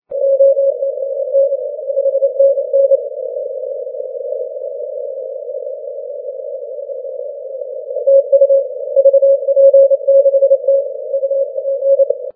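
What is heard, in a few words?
40m Morse code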